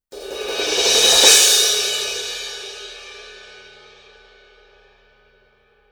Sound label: 18 thin crash; sabian; aa